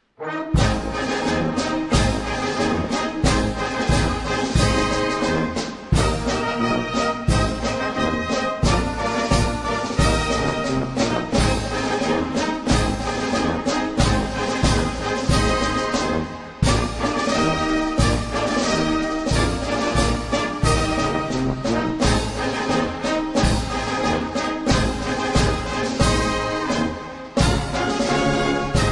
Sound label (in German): hausacher narrenmarsch